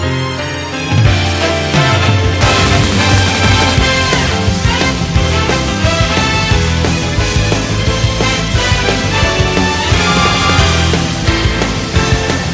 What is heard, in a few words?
Instrumental